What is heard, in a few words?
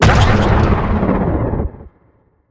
laser Gun Shot; Weapons